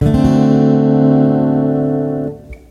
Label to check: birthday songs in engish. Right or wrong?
Wrong